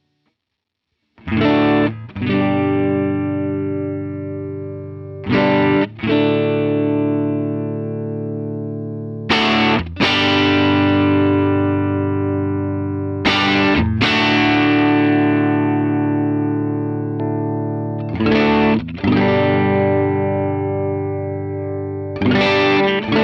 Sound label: Nighthawk Samples Rhythm LP Sound